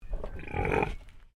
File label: Animal Pig Landrace Grunt